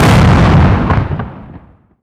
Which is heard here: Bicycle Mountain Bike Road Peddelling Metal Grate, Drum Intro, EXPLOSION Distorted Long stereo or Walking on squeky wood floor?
EXPLOSION Distorted Long stereo